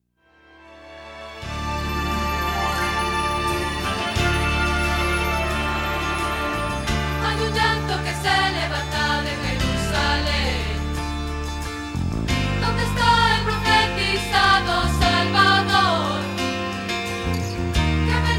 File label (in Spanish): Contralto